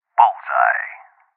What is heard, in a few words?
Sniper Shot